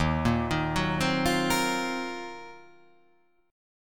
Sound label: Eb Minor 6th Add 9th, Ebm6add9 chord; arpeggiated